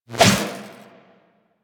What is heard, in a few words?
Electro Prod Whoosh; Warframe; Weapons